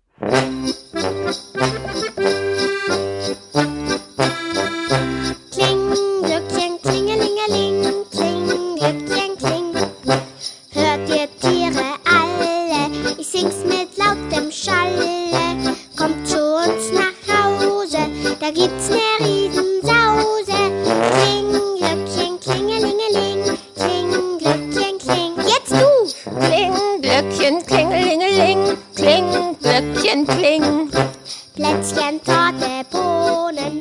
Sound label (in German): Kling